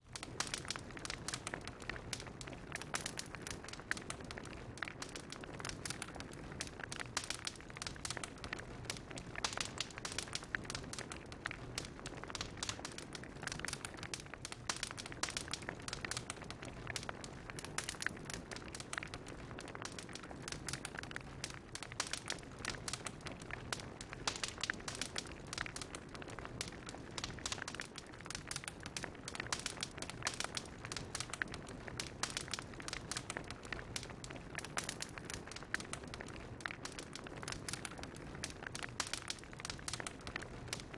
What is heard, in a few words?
Bonfire